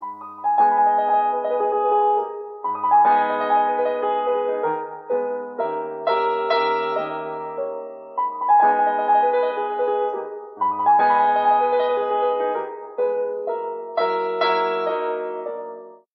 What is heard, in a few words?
Honour mobile ringtone